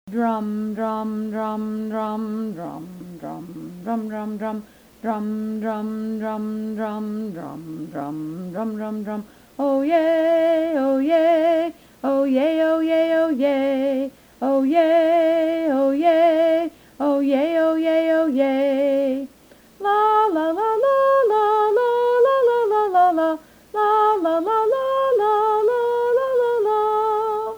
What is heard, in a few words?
Orchestra